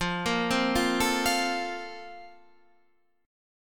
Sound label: Bb-Major 7th Suspended 2nd